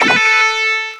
Note Spreads; Normal; RockStar

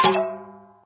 Evolution Fail

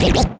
slide

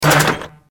Transform End; Roll Cake

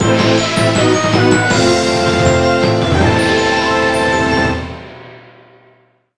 Bgm open chapter

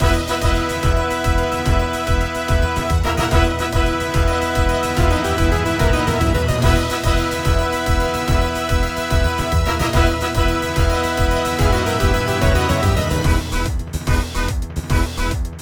Bgm map dragons valley hard

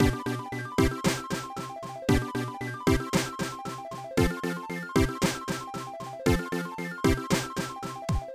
Bgm ui minigame score

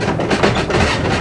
Cannon Aim Loop; Captain Ice

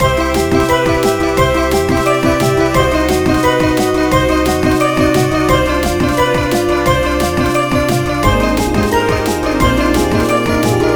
Bgm cow bonustime night

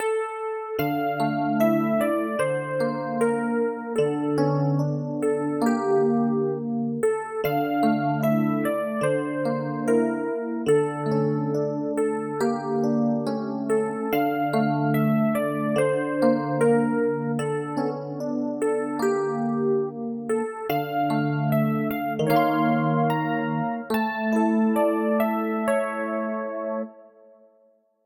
BGM; The Bittersweet Ending

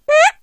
slide

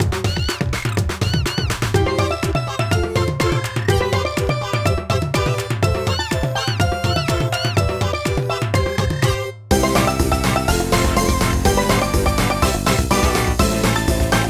Bgm map faster

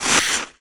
slide

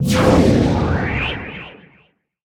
Bear Meteor Fall; Pink Candy Pet

